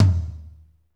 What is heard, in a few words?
TOM, Toms, Roland; Northstar - Drumscapes Roland